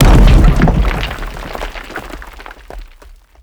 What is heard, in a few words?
rock impact big; skibidi critical dumpster; Skibidi OCTOPUS